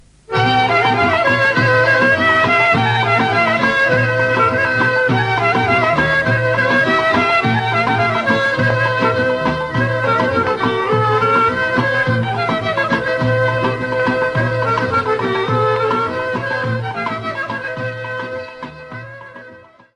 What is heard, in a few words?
kolo; Bulgarie